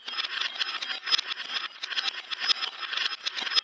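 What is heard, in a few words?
Maze Retro-Game Drawbridge-Go-Down Reverse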